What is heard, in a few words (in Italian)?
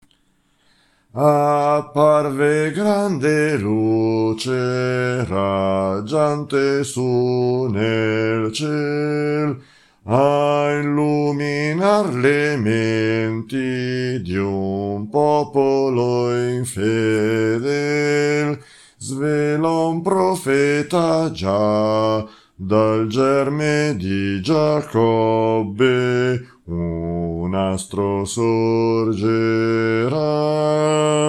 bassi